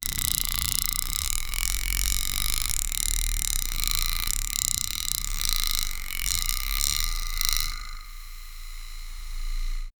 uma esfera de energia, energy sphere

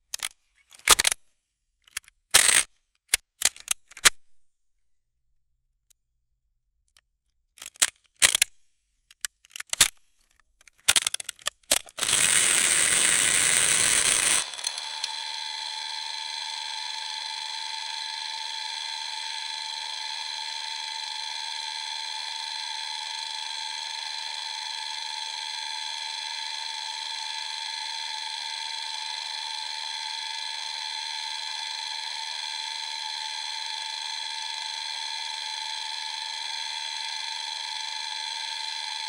um rolo de filme de, 80s

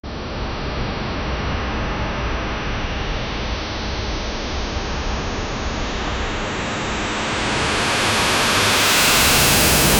som para um video tenso; tension

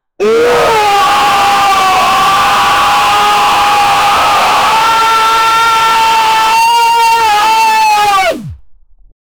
grito de um monstro

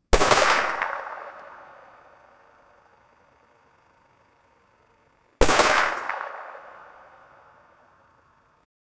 tiros de espingarda, firearms

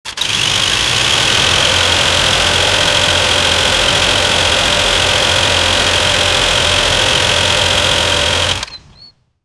efeito sonoro de um ae86

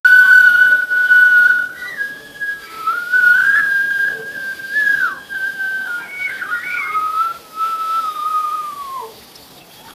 som de pequeno vilarejo

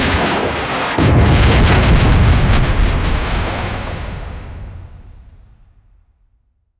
raio atingindo um tanque, destroyed

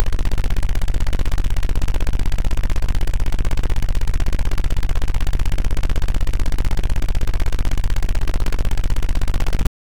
alarme industrial pulso; mechanic

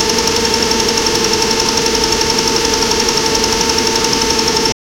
moto tron correndo; vehicle

